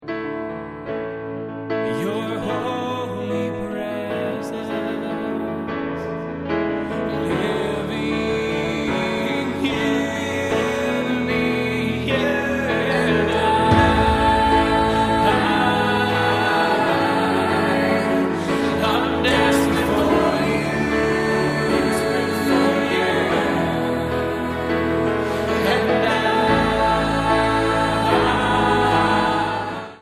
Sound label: breathe